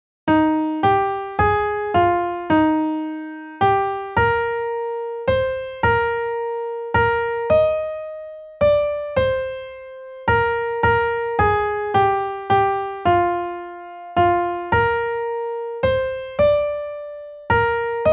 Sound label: When I Survey melody, piano